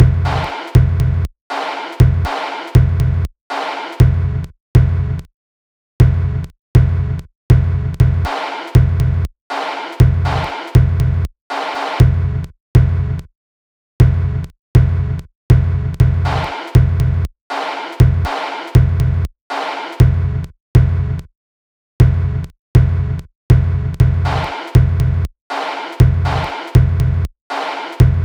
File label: Sine Wave